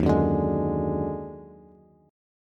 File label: D sharp Minor Major 11th, Bass, Bass Standard; D#mM11 strummed; 11,9,12,13